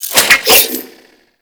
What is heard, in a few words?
Whip Spin; Grineer